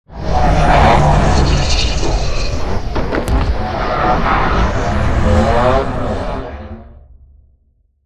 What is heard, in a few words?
strafe close; Beacon; Titanfall 2